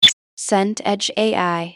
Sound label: blip down